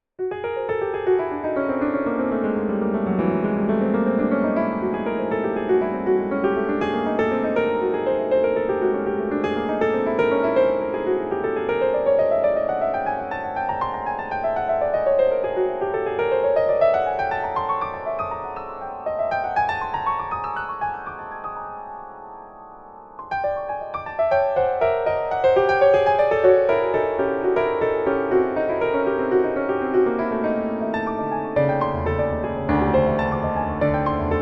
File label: Piano